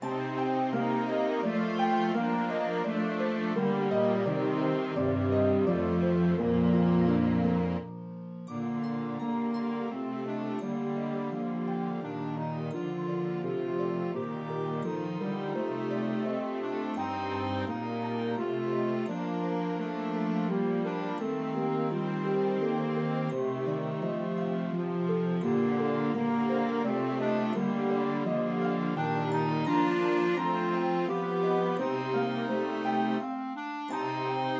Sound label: Clarinet Track with Melody; Away in a Manger